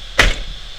van driver door closing sliding doors open windows open engine on